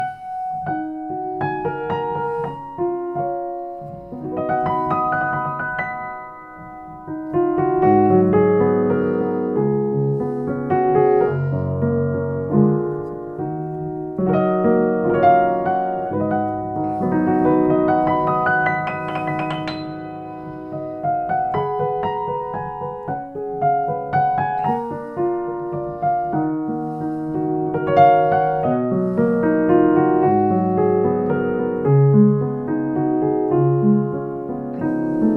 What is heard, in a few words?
Grotrian Steinweg, piano